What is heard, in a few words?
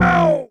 slide